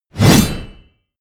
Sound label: blade whoosh large